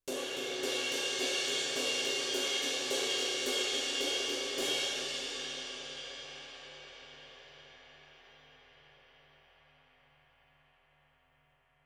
CRASHRIDE BUILD; 20

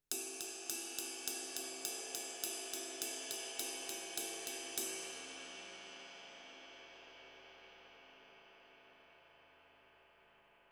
RIDE ROCK

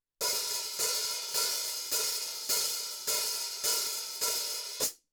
HH OPEN

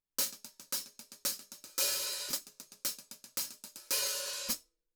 14 HH CLOSE OPEN, cymbals

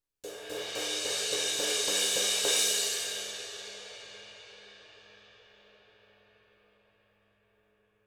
CRASH BUILD

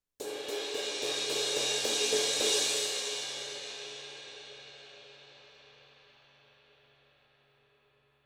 18 CRASH BUILD, cymbals

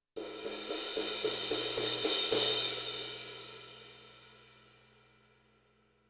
18 CRASH BUILD, cymbals

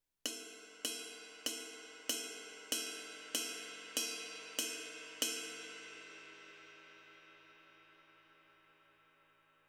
22 RIDE BELL, cymbals

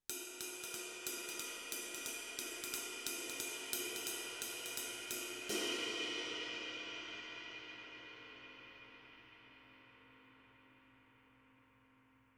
22 RIDE JAZZ, cymbals

